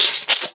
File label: smack, Cartoon impacts and smacks